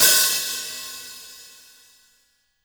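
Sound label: JAZZ KIT hi hat open, Drums Acoustic; AKAI; JAZZKIT 1; 90s sample CD; USB Soundscan vol.10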